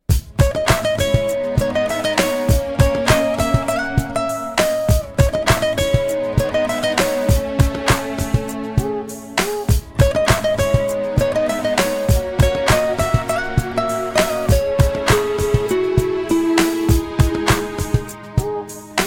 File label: guitar ringtones